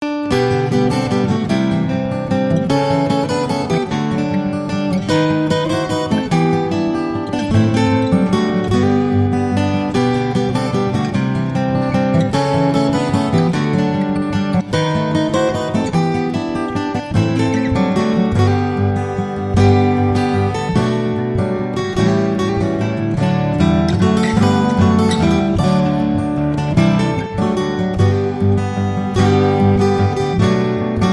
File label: We Wish You A Merry Christmas, without Jingle Bells